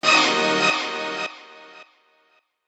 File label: Stab, FX